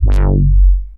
WOW G0, BASS; Loopmasters; Jack Sparrow; Deep Dubstep Progressions

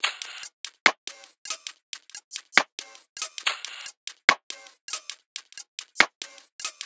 140 bpm Top Loop, Dubstep; Octo

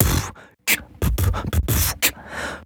90 RnB, Human Beat Box; Loopmasters

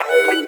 E stab; funky; Loopmasters; Funky Underground Vol 2

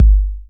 KICK, DRUM HITS; Loopmasters; The New Sound Of Dubstep

